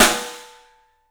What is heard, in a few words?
Studio Snare; Percussives; Studio Snare 04